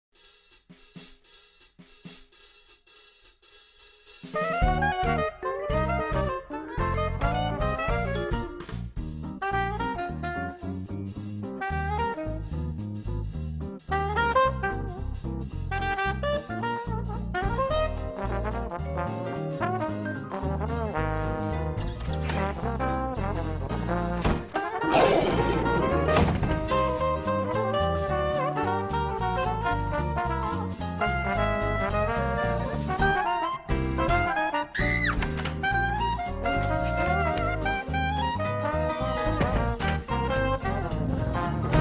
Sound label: jazz theme song; monsters inc